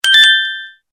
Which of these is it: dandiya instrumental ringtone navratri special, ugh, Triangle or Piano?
Triangle